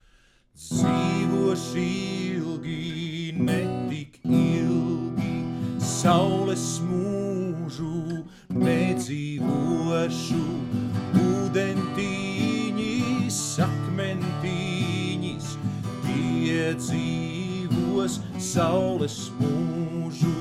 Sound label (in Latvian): ar gitaru; Kraslava; Es atnacu uguntinu